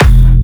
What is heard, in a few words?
Jump Kicks, Bassdrums